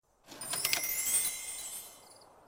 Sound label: kaching